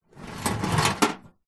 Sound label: metal toolbox with tools inside tilt movement; industrial